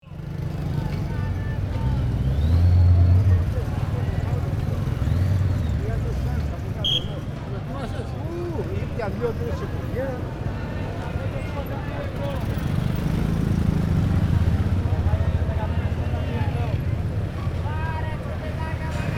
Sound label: Street market ambience near street